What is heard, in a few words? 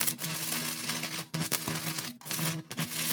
spinner Sparks High